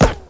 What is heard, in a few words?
cg soft, weapons; Tribes2